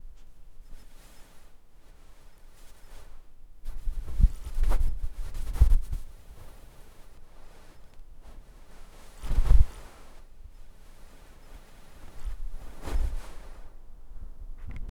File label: the sound of the tent, camping